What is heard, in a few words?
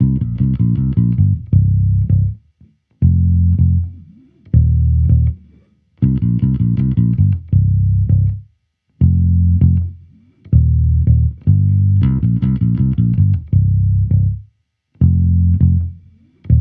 Amped loop 80 Cm, Electric Bass Loops; Slate Digital BASSHOLE Sample Pack